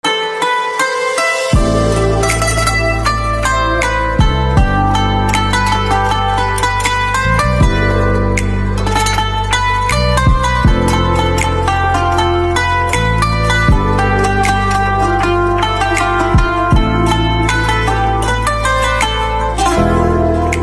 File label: Mandolin